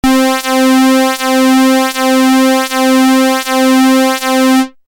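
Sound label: real pulse width modulation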